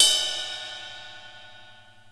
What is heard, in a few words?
Cup; Zoom RT-234; Kit B